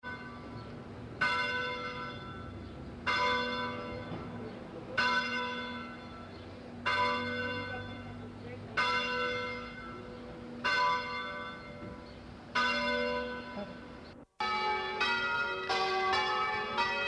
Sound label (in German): Tombolo Glocken